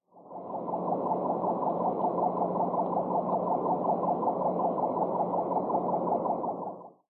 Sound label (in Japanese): yotaka teion, nasushiobara